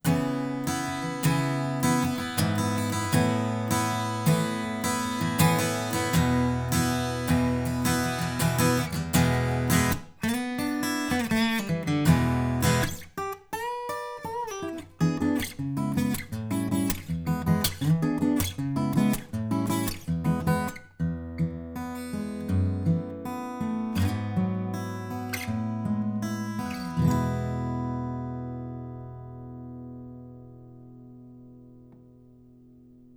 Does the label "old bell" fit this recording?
No